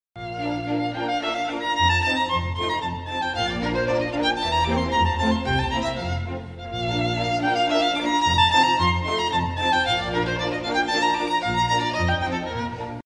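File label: Polonaise Huawei, ringtone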